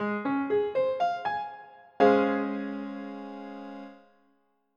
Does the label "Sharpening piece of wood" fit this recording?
No